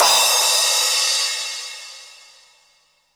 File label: Crash, Cymbals; Vengeance Samples; VEH3 Cymbals